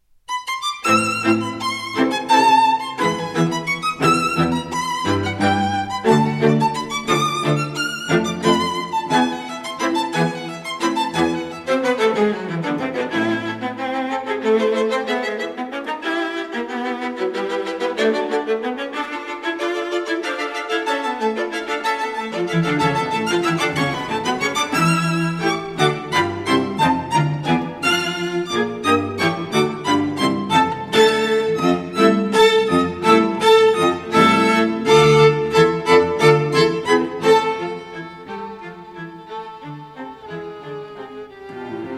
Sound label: String Quartet No. 2 in A Minor; Op. 51, No. 2; Brahms